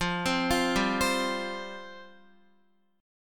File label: F Suspended 2nd F down Guitar; Fsus2; arpeggiated; 4E,4A,5D,5G,5C,6F